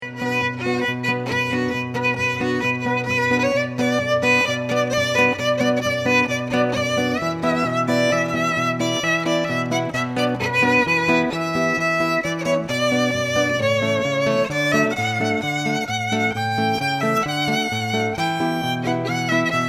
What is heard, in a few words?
Medley, violin